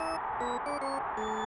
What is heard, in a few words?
Organ Theme